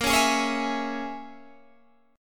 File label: Bb Minor 7th Flat 5th, Guitar, Standard; Bbm7b5; strummed; 18,16,18,18,17,16; JGuitar